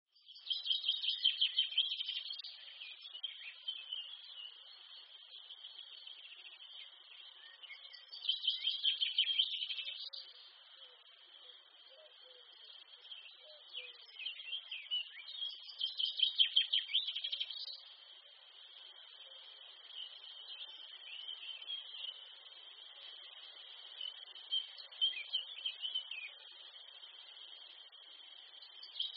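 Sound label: Ambience Countryside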